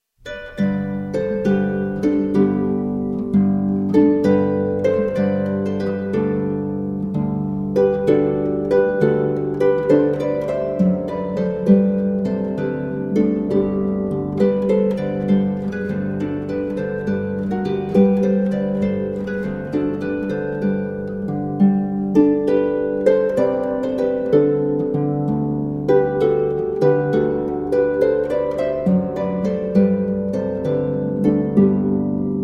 Traditional Neapolitan Carol- Short Fantasy for harp